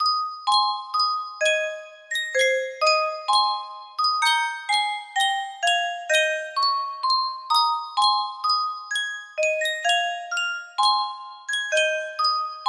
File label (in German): yunsheng spieluhr